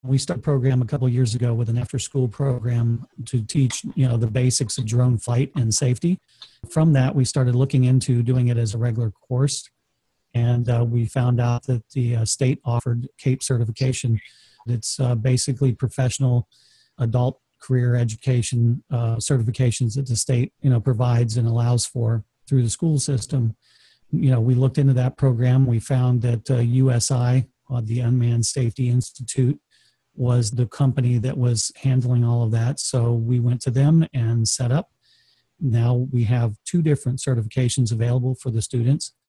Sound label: Drone Reese Intro